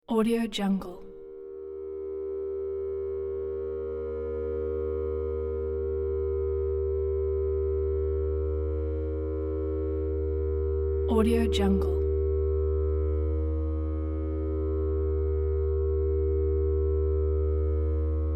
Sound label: dark atmospheric background; futuristic